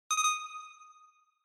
Short Accent Reverb

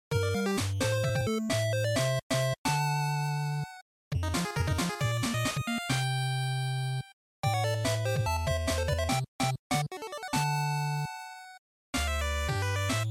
GB Sports

